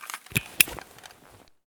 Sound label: grenade throw start